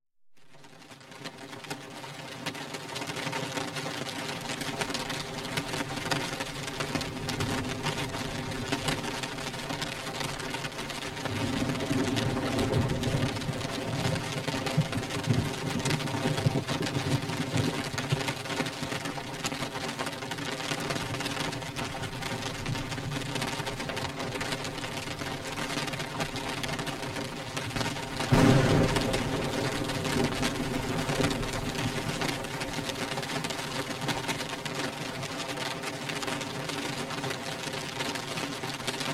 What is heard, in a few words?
relaxing rain on window